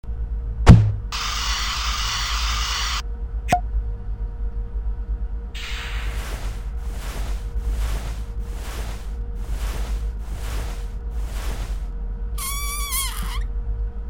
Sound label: Helium; The Henry Stickmin Collection